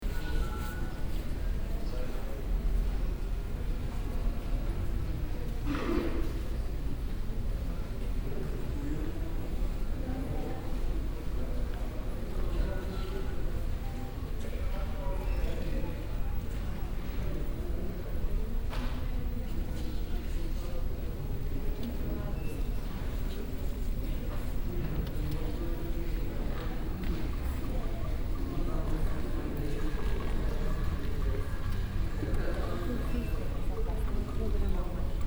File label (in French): silence lecture; bogota; tintal